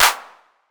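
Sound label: 808 clap; PERC TR; Wizoo - Platinum 24 Electronic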